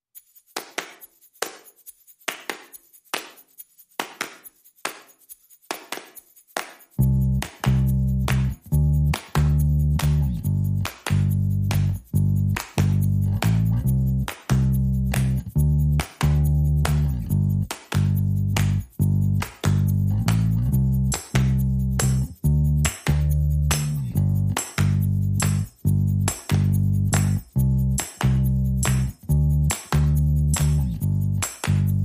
Drums and Bass Mix

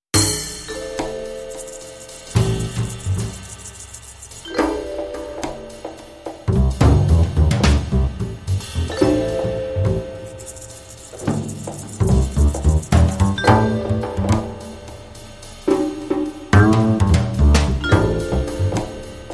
Quirky Jazz Club

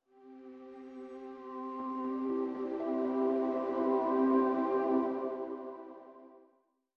Link Sting

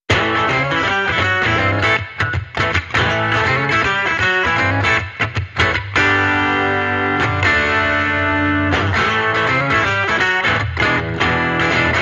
Electric Redneck Guitar Only

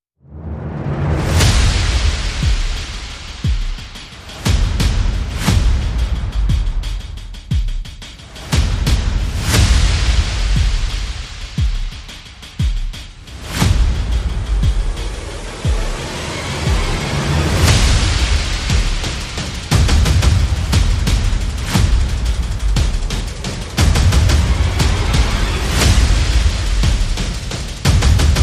Percussion and Effects

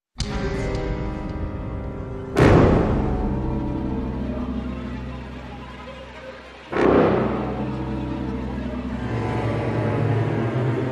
Less SFX No Choir Mix

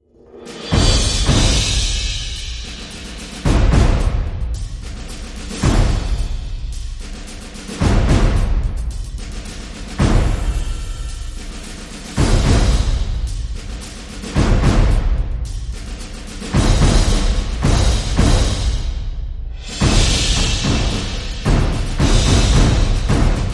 Drums

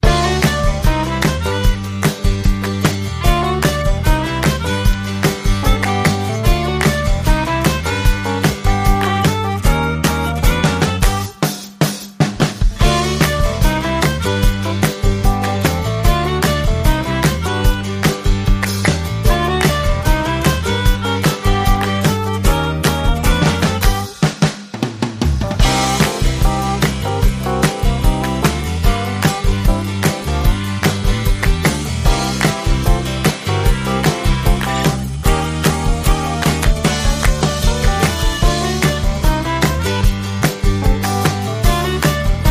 Swamp Bounce, No Horns

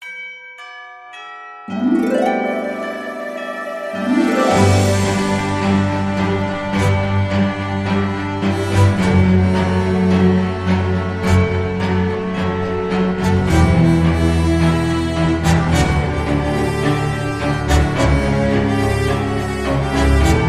Christmas Bells

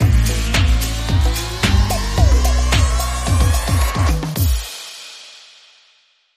Disco

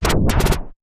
Quick Fluttering Zap

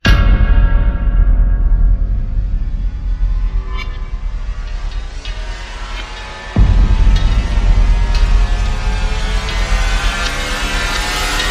Church Bell Rise

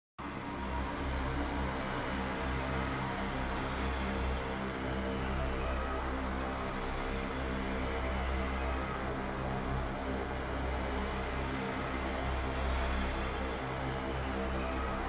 Drones

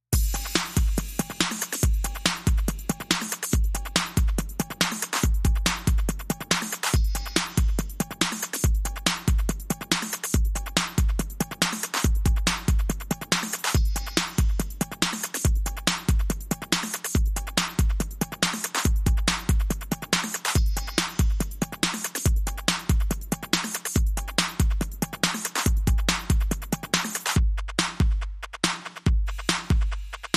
Bonus Beats

